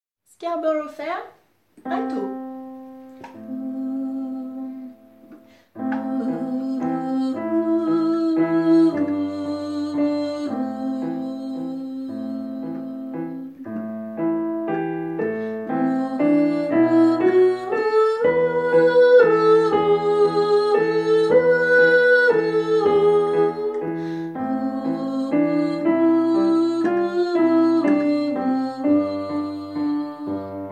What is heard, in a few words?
alto